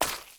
boots mud; Apotheorasis

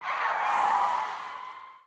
police skid back; Blind Drive

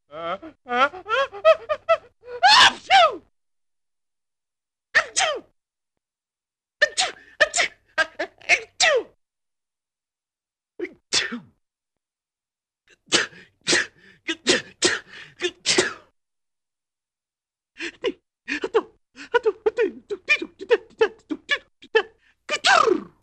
Funny Man Sneezes; Hollywood Edge; Cartoon Trax

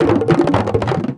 Bone Tower Percussion Rattle; SFX Kit; Foley - Percussion

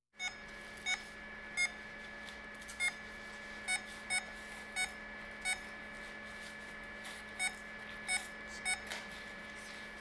Hospital effects

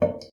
water dripping; Apotheorasis

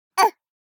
BABIES TALK Boy 12 months Oh; Baby Boom

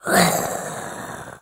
Zombie; Blind Survival

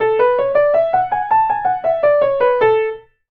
Piano Play Scale Up Down; SFX Kit; Foley - Misc

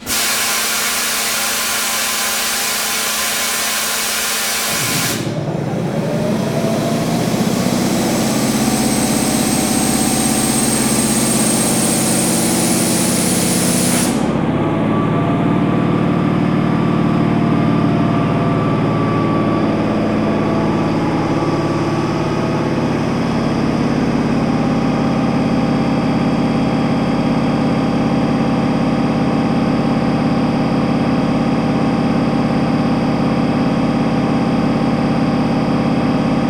train starts motor; best service Blue Box

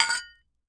Hit Glass Jar Clink; SFX Kit; Foley - Misc

